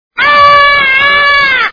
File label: fox ahh